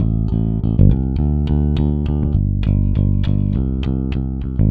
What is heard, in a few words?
Rock, Bass; Bass X